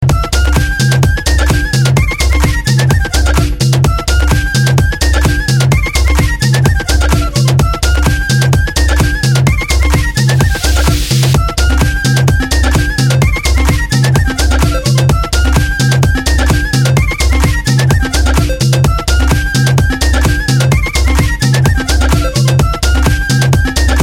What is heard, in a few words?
Flute Clubing Mix